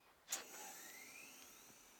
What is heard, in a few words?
Chair Piston Moving Down